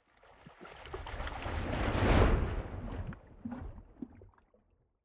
waves splash

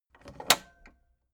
tape deck close soft

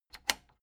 plastic button latch squeak click disable fast

